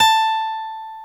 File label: GTR, Dual Guitar; Club-50 - Foundations; Paul